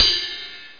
F1 Ride Bell; SoundMusicSys; Simple MIDI Player

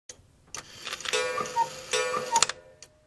cuckoo; WMBonusXP

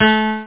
ACOUSTIC GUITAR

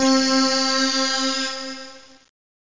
digi syn 3, keyboards; Zoom - Release 2; Active Software

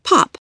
pop

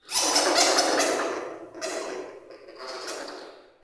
bat; DAoC

